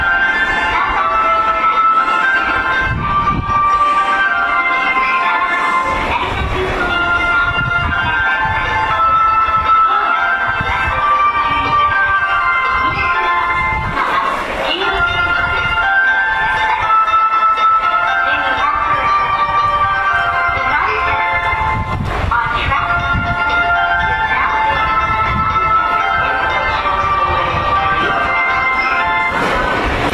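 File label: Departure Melody, 成田エクスプレス発車時は英語放送もあります; 埼京線・湘南新宿ライン; 池袋・大宮方面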